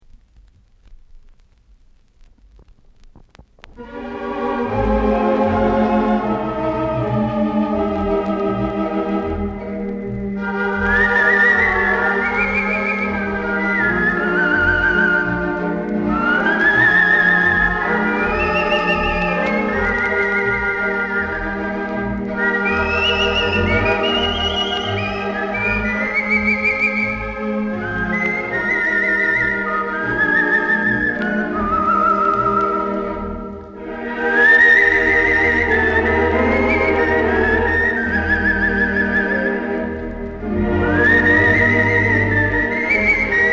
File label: Birds Sing; whistling